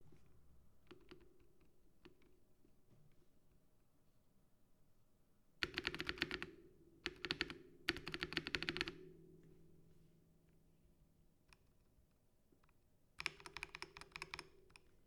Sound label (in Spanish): Prueba stabs espacio shift enter atras Razer Blackwidow V4 75